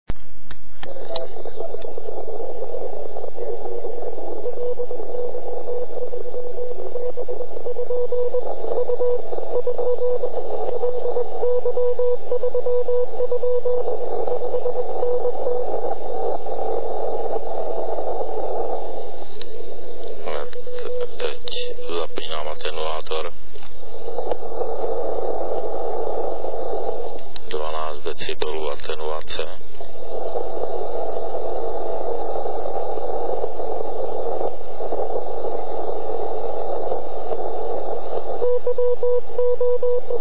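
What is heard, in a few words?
FT1000 CW Filter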